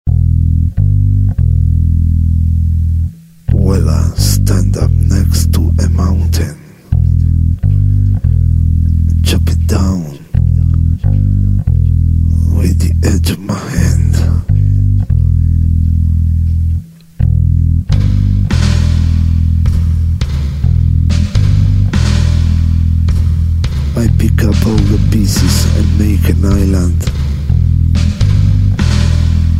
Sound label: Bass